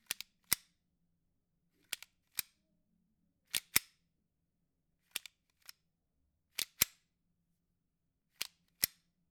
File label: Gun Load